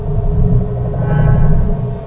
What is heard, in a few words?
hum